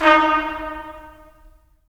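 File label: brass trumpets staccato, right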